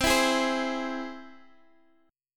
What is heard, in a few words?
C Augmented, Guitar, chord; strummed; 19,19,20,17,x,x; 4F,4A,5C,5G,5C,6E